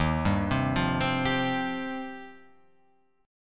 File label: D sharp 6th Add 9th, D sharp, down, Guitar, Open F; D#6add9 arpeggiated